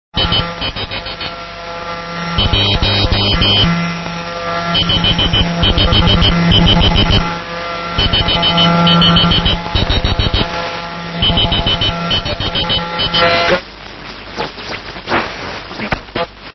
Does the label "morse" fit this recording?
Yes